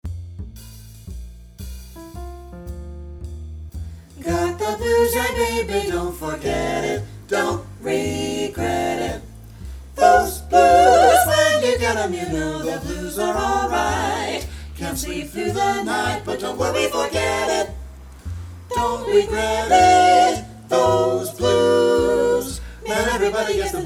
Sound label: Vocal Blues Etude in F All 5 parts; jazz all state